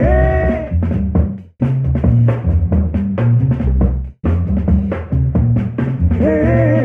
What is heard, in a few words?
bass hey hey heyyyyy, Loops, Phonky